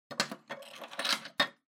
Public Phone Return Slot

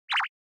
Anime Motion Single

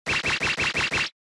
Motion Agility Phrase

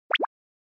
Anime Motion Mid Low

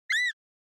Horror Scream High Dry

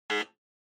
Electromagnetic Buzzer, Dry

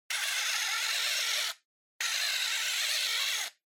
Outlet Timer Dial; タイマー機器

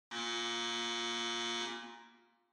Electromagnetic Buzzer Hall

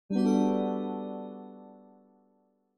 OS Sound Notification

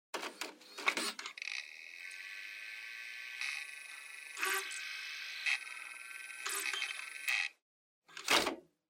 Portable Stereo Driving Noise MD Loading

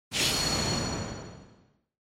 Onoma Syakiin Short

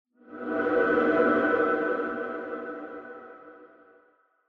Horror Accent Short

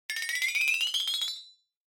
Flexatone

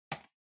PC-Mouse (Far-C-Hard)

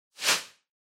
Noise Sweep Dry

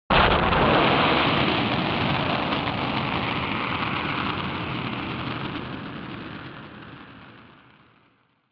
Retro Anime Explosion High Long

